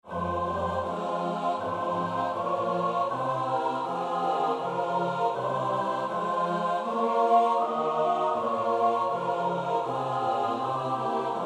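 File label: Choral, soprano alto tenor bass; Aus Tiefer Not Schrei ich zu dir (Op.23.1); 1 Choral Aus tiefer Noth schrei ich zu dir (S-A-T-B)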